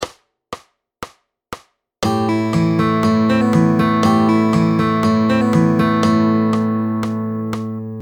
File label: Fingerstyle Picking Pattern; Fingerstyle Fun; P3 Supercharging a Picking Pattern